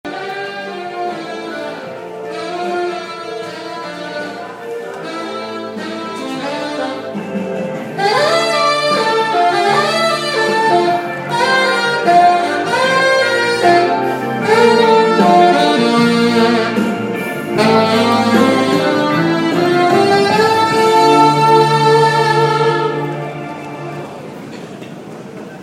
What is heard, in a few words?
sax player at leicester square tube station